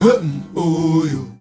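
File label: MALE GRP CH, M. CHOIRS, Heart of Africa; Spectrasonics; Heart of Africa 2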